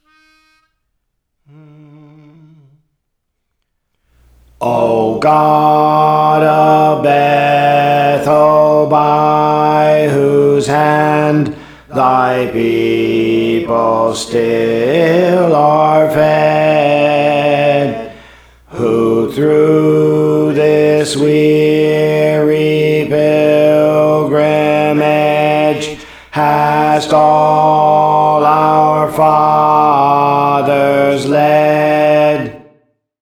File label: Alto, Songs; Praise For The Lord Training; O God of Bethel